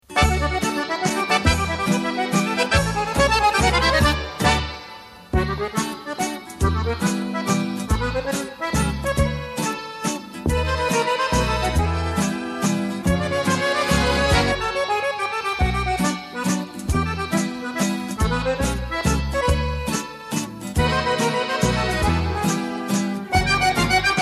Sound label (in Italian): Mazurka, Fisa